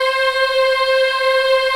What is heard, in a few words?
C4 child eh, Choir; USB Soundscan vol.28